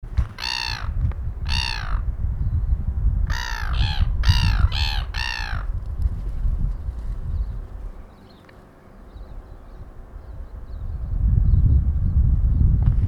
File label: sea gull shorts port chalmers